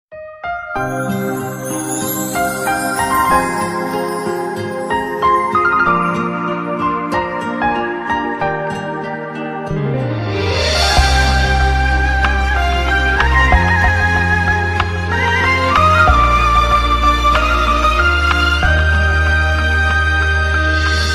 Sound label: Romantic